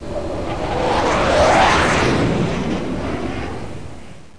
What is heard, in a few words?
f16 flyby; American Civil War; Interactive Magic; f22